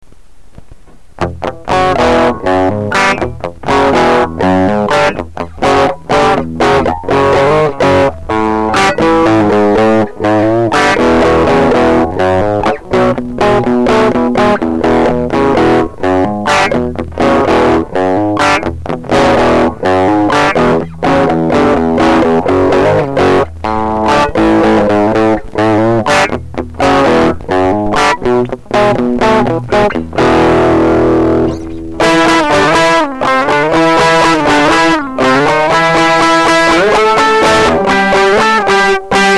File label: prostoy fuzz music